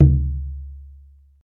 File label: deep tom drum single hit d key